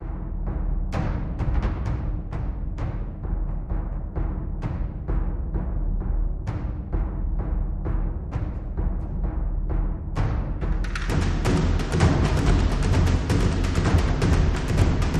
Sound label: Only Drums